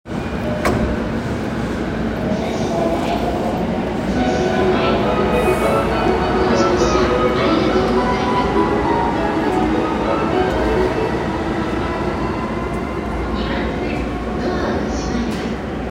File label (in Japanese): 中央線快速武蔵境西, train sounds